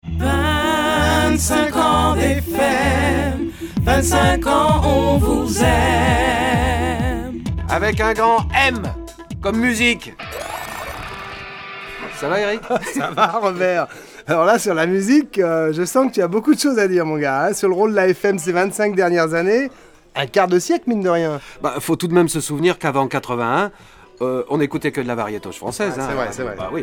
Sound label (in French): musique; 25ans fm